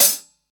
treble heavy closed hi hat sound B key, pedal hi-hat sound tuned to the; hat samples